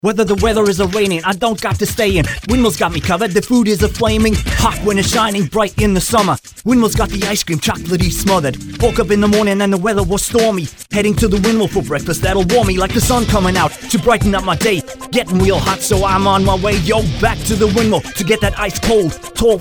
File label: Weather Rap; Windmill